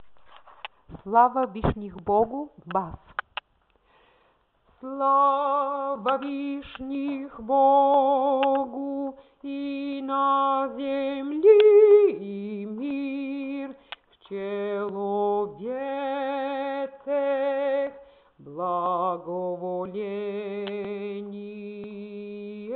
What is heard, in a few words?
basses; Slava